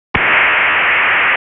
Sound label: MIL188-110A 2400 ZERO; MIL-STD 188-110A/B serial tone waveform with 2400bps and ZERO interleaving; PSK-systems